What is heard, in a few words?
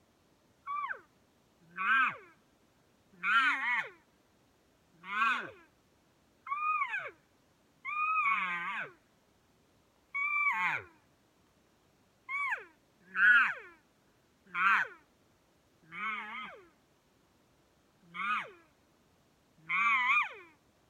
Calls Cow Contact Buzz, elk